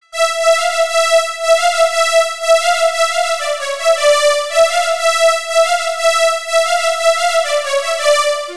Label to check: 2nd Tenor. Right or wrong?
Wrong